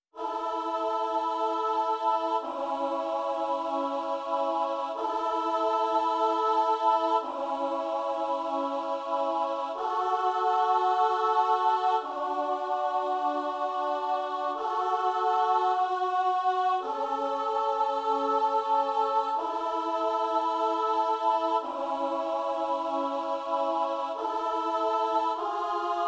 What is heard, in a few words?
alto; This Little Light of Mine